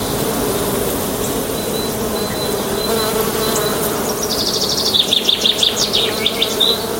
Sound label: bee